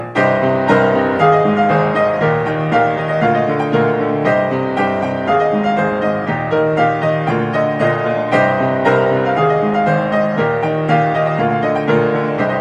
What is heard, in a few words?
Piano